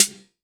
Yamaha CS1x Drums

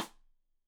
Side Stick Stereo; Deeply Sampled Drums; SMDrum

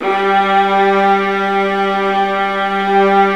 Violas Bright, String Sections; Roland LCDP13

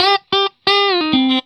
RIFF 120, Vintage Blues Guitar

